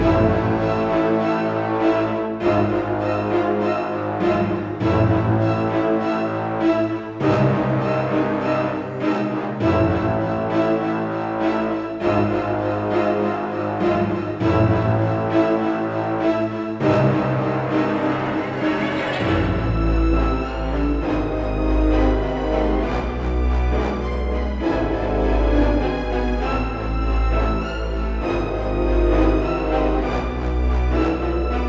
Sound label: the kraken loop; Ocean music pack